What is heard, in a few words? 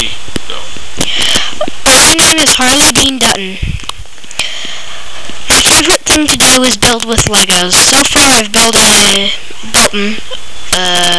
hdd, harley